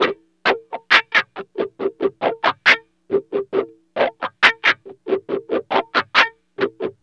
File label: WEIRD WAH, Bizarre Guitar; Spectrasonics